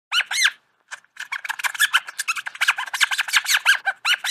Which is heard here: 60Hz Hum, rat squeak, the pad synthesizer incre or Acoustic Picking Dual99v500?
rat squeak